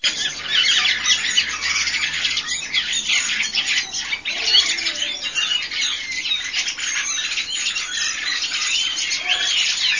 Sassellara

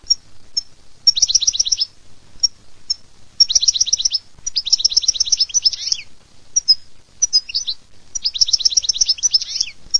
cardellino

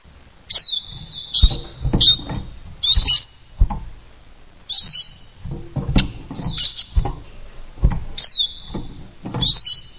LUCARINO

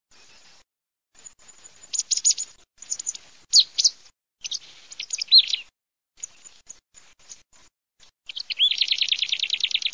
CINCIA BIGIA ALPESTRE

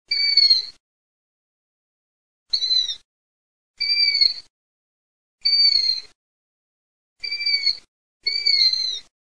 CAPRIOLO

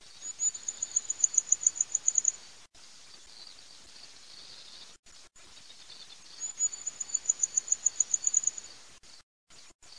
fioraccino

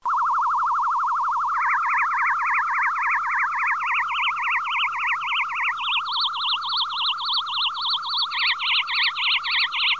USIGNOLO

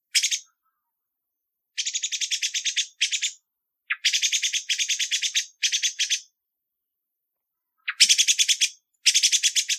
schiamazzo

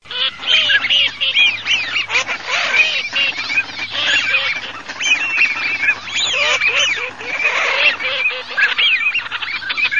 alzavola fischione, birdsound

